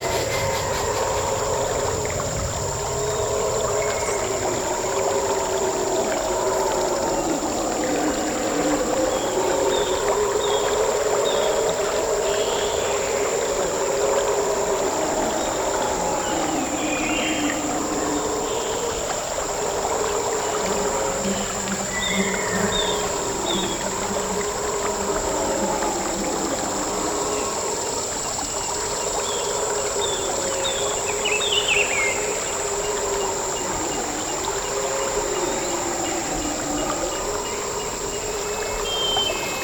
nature rainforest ambience by river monkeys groan in bg insects birds; felix blume